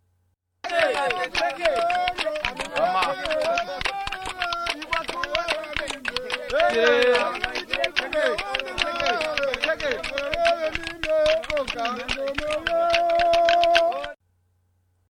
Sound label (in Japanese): トゥワララ・クチホンゴの即興旋律; africa